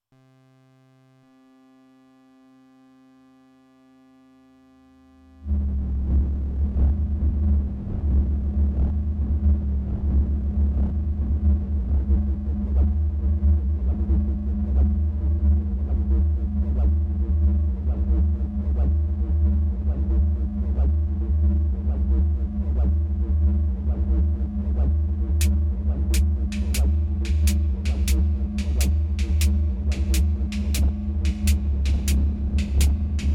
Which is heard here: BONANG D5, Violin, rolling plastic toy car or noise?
noise